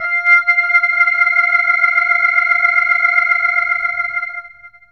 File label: ORG TON, ORGAN; E-Lab; X Static Goldmine 2; akai